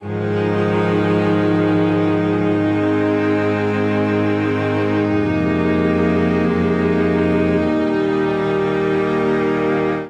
Phrase Reflections I-iii-II Db Major 95 bpm, String Quartet; MIDI Clips; Ableton; Factory Packs; Spitfire Audio